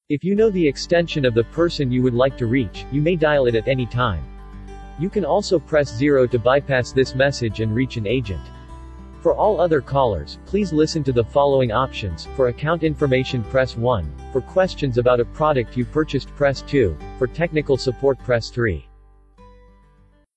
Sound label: IVR Music